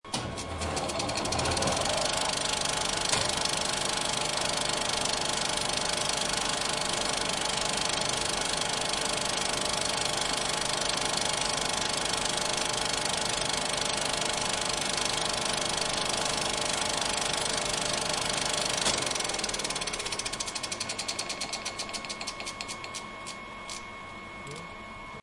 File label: camera tape rotation; نوار دوربین فیلم بردا